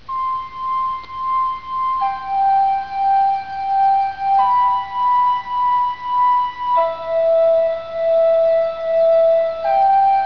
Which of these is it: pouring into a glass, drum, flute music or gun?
flute music